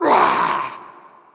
Hood Wolf Attacks

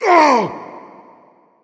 Hood Wolf Wound Crit

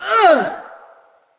Wound Heavy, Creature; Eydis

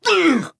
Wound Crit; Valanar

